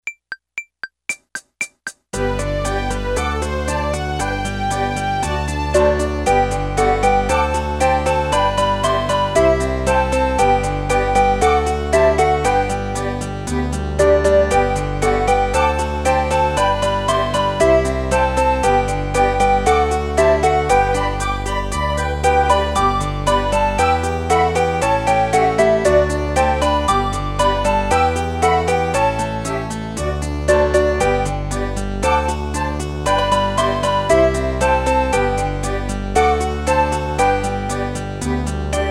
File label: GRANDFATHERS CLOCK, Yamaha PSR-300 Factory Demo Songs